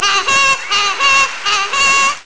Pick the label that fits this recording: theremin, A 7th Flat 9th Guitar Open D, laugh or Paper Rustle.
laugh